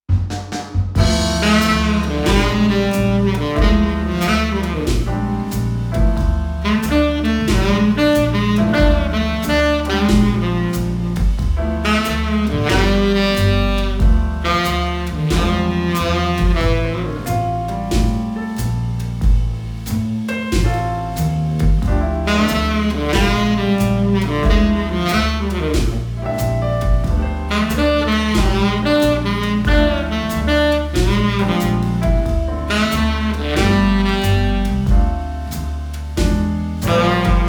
Sultry Blues, Mono

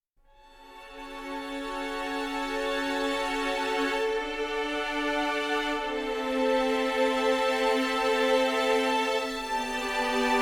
Exploration; Skellige

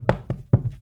Death Stumble; portal quest; android; Rebel

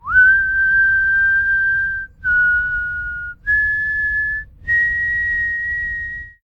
Idle Whistle; The Town Sam The Farmhouse; Devour 2021 gamerip pc macos

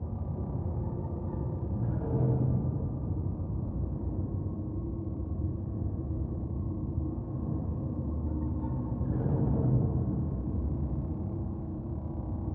Scary Ambience; five nights at freddy s fnaf sister location

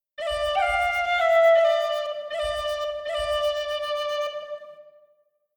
Combat Out; Skellige

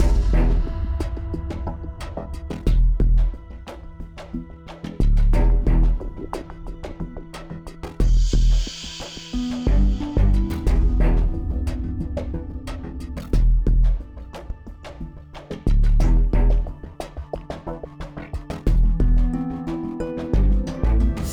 Melody Loop; bakugan champions of vestroia 2020; switch gamerip

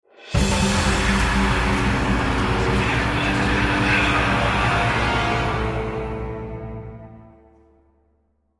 Combat Heavy Outro; Darksiders

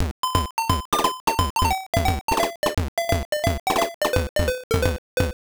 Pattern Clear; Mr. Goemon; arcade

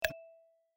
Chip Landing; my cute commissar 2020 gamerip

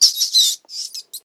rat squeak; Devour

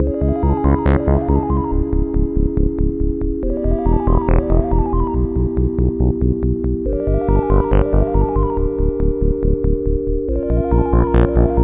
Tense; serre ost

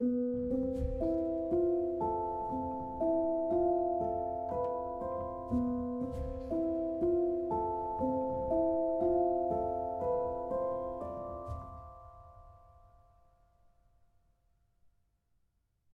mountainvillage bgm 60bpm; chicory a colorful tale; ps4

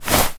run foley

